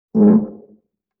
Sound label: Viola String Finger Rub; Vintage Cartoon; Musical Elements